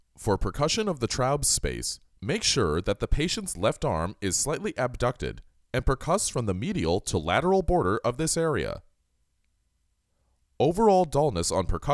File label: Percussion; Ab Percussion